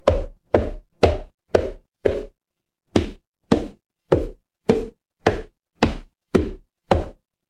footsteps stairs

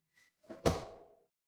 impact slam

